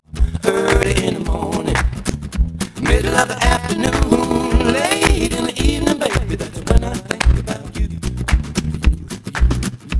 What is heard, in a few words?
noised; 4bit